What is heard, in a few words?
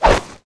ATTACK SPEAR